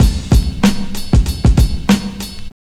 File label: house loop